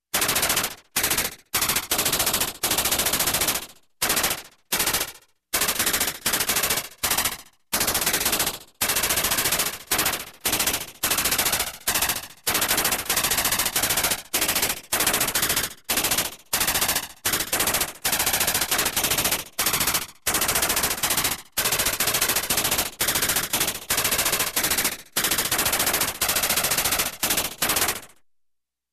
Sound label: Electronic gatling weapon